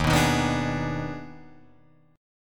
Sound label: Eb Major 7th Suspended 4th Sharp 5th Eb, Guitar, Open F; strummed; 3,6,3,6,5,3; EbM7sus4#5